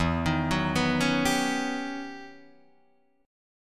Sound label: E Minor Major 13th E Guitar DADGAD